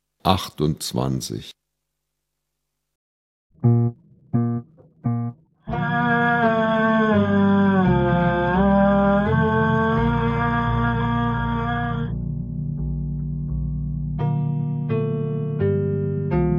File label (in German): Klang, Hornschule